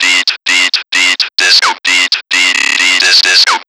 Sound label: Processed Vocals, Loops